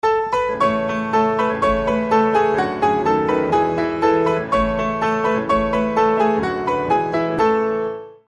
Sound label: mozart piano sonata 11 rondo alla turca; 1783